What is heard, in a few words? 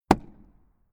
vehicle door slap